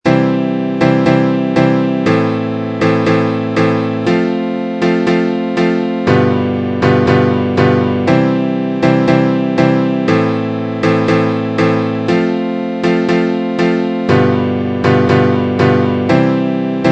relative minor example chord progression, guitar

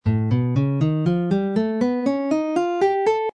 a whole tone scale sixth string tonic, guitar

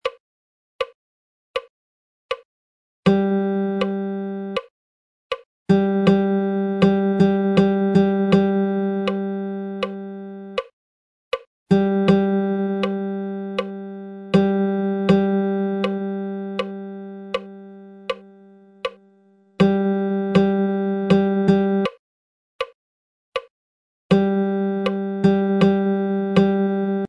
dots and ties rhythm example, guitar; dots-ties-theory-lesson

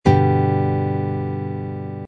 g major open chord, guitar

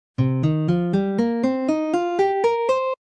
c minor pentatonic scale pattern, guitar

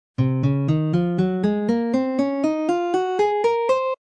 c locrian mode scale pattern, guitar

